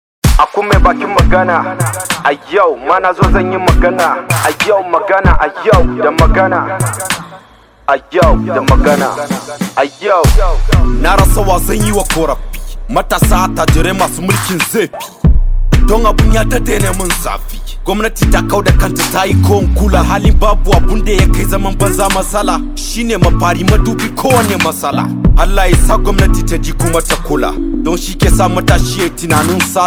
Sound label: Hausa HipHop